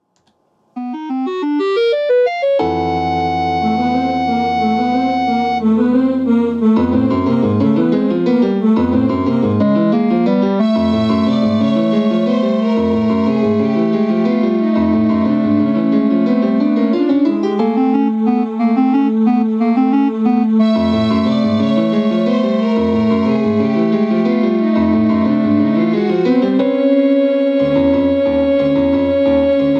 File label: music